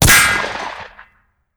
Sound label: bullet impact metal